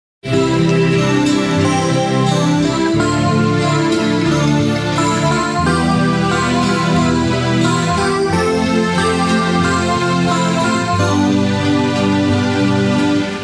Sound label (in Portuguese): classica